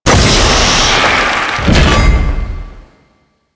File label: Loot Crate Medium; Warframe; Entrati